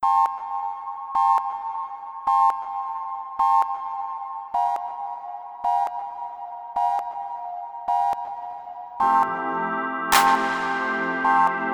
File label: Sine Wvs Song